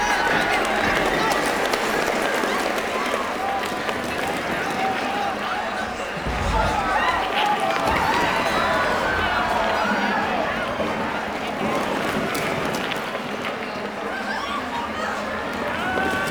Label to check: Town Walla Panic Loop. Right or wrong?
Right